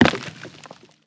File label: pine cone destructible